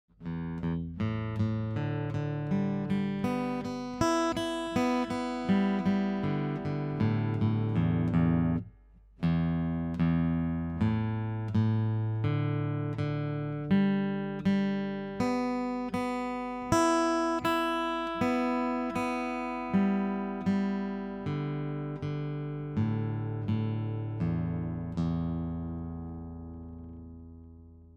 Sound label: Open Alt Picked, guitar